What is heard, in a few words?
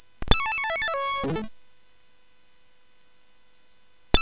Sound Fx, CSG8580; hvsc